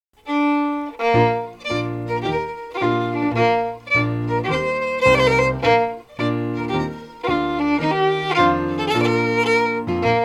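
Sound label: Strathspey, Scottish Fiddling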